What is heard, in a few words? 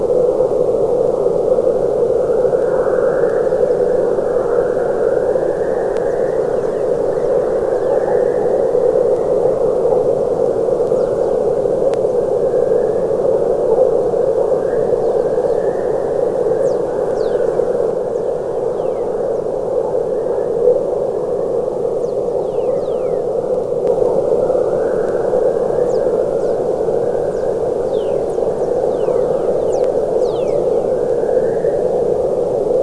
WFR audio emfisis